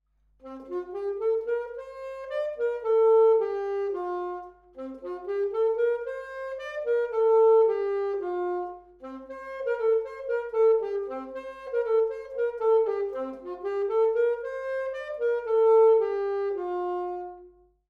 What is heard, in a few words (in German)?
Sound Saxophon; blaeserjugend; musikmentoren